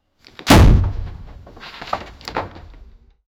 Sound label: sound of falling from a, athletic